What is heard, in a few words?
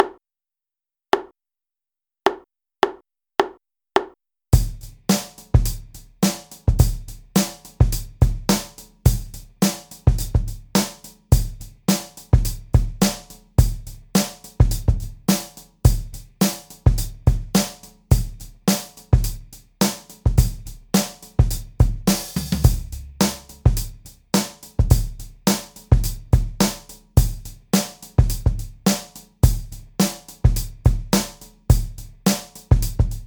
Drum 106